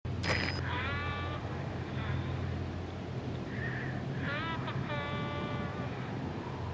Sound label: Quiet humming alert